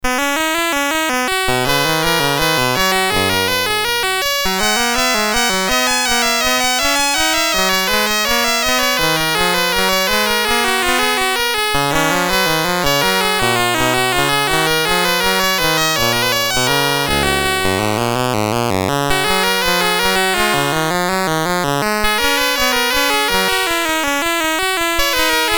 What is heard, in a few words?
Invention 1 in C BASIC, Commodore MOS6581R3